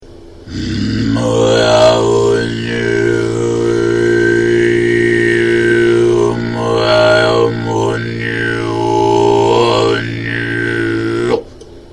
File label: kargyraa attempt, khoomei